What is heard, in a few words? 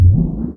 doors